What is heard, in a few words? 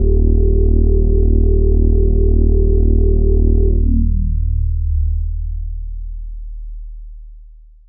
J Bass, Bass One-Shots, Drum n Bass; KJ Sawka - Drum n Bass & Beyond